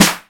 sharp clap f key, Royality free clap tuned to the F note. Loudest frequency: 3838Hz; percussion; single hits one shots